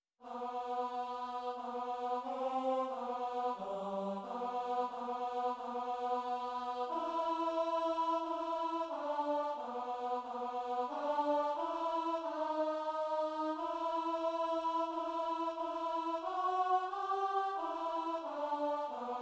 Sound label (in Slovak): alt, zborove skladby